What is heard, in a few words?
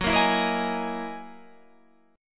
F sharp 13th F sharp Guitar Standard